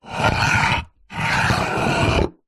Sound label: household swimming pool skimmer cover stone tile slab movement slide; zapsplat